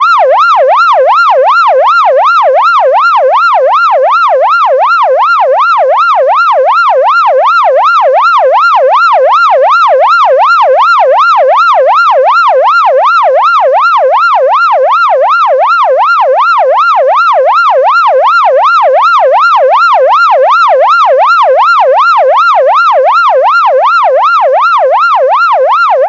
electronic siren

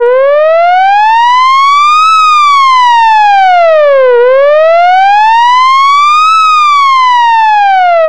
short alert siren

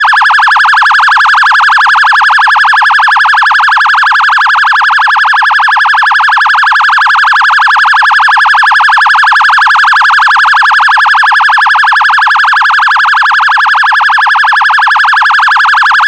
electronic phaser siren

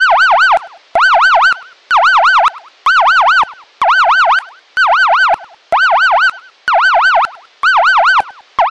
warning electronic siren

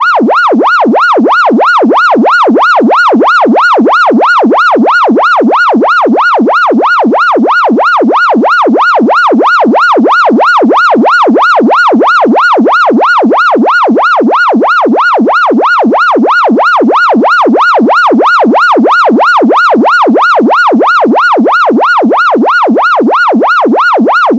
electronic siren

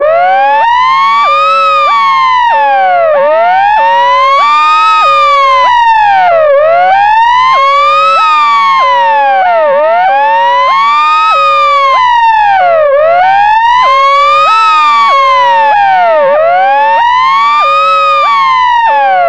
ambulance wail siren

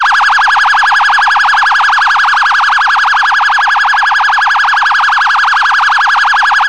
police phaser siren

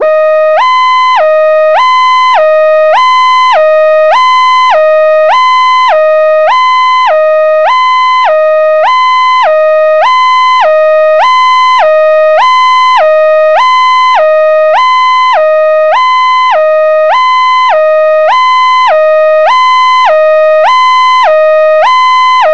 police hi lo siren

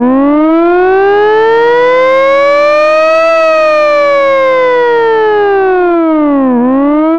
vintage mechanical siren

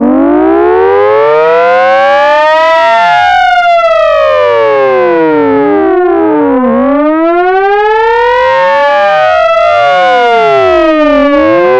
civil defense alert siren

